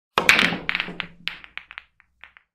Billiard

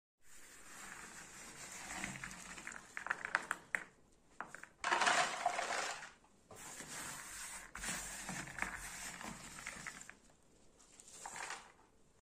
Puzzle

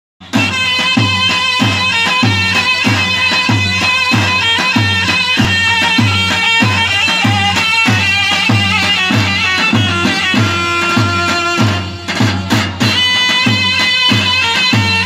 saz chapi

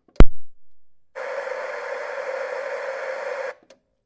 turn on tv

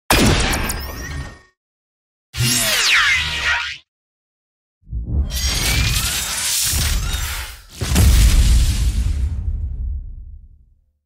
Future weapons

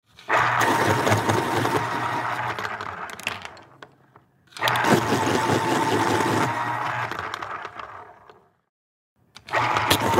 Pencil Sharpener

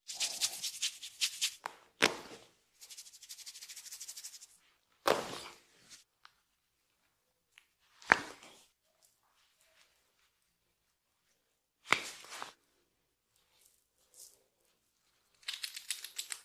Massage

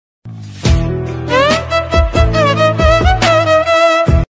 SMS ringtone for girls

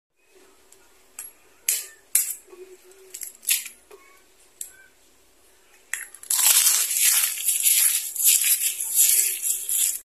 egg shell

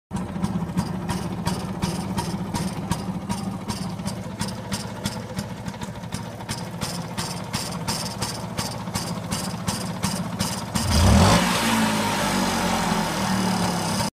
Vespa

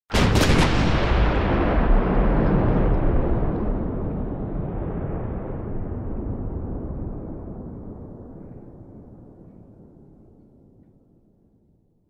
artillery

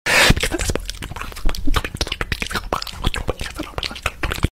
ASMR Mouth

